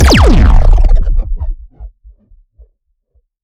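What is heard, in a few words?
Sci weapon Shot Large Laser Eraser Phaser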